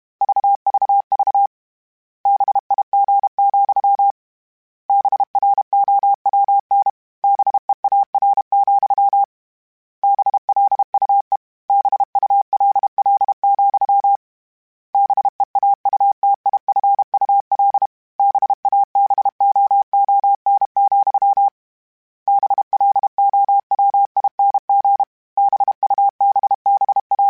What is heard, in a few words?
Morse Code, CW Code Groups; Mixed